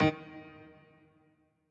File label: piano riff intro